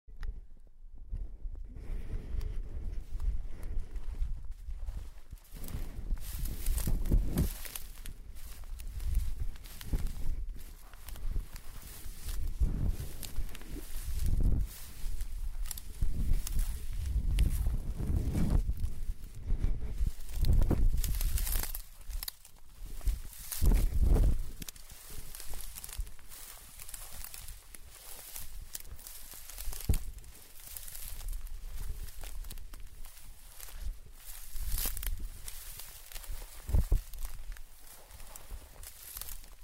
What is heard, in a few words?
steps